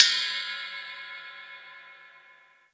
ride bell, Yamaha TG-500

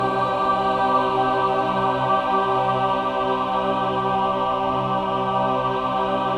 LC MIN C4, Symphony of Voices, MIN.CHORD

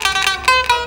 TANBURA; Best Service; World Colours

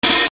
LINN OPHH

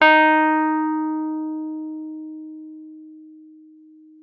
WURLITZER, D#4; Xsample VOL-1; 90 s Sample CDs; WURLITZER RS

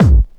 kick techno, drums; Techno Trance Essentials

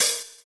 Open Hhat, Hihat; Equipped Music; Loungin House

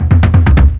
drumbreak, 130; Ueberschall; Techno Trance Essentials

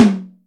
Dry Hi, Acoustic Tom; Yamaha DTXpress

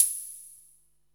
CYM1, Electro-Harmonix DRM-32

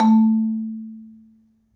A1, Marimba; Terkelsen

